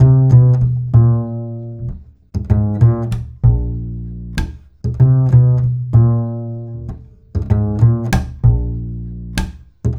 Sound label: BASS TASTER; Sonic Foundry (Sony Creative Software); Drums from the Big Room The Mixes; Demo Projects